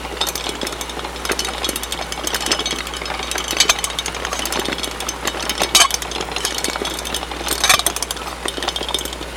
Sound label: pumpjack squeak loop